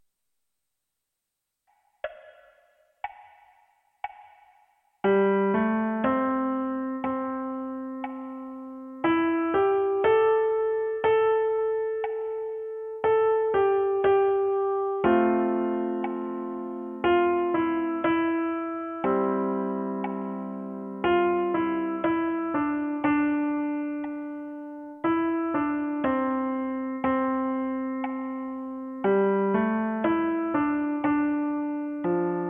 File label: piano